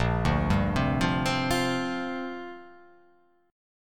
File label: Bb 6th Add 9th Bb; Bb6add9 arpeggiated